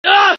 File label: high damage death